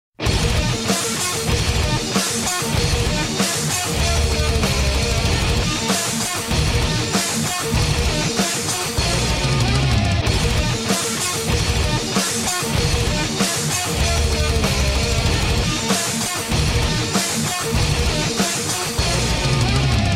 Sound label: rock ring